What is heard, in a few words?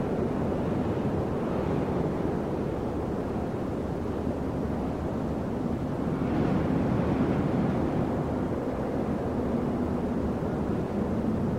ambient air; Bad Piggies